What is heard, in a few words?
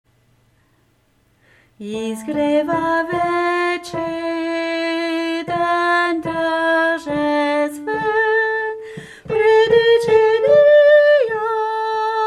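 Soprano, chants; SATB; Izgreva den tarjestven; Peter Deunov; concert du 15 août 2009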